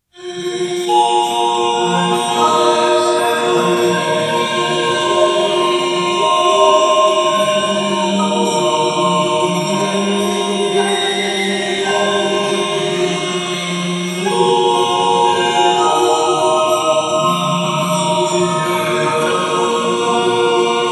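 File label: a clock time machine moving; historical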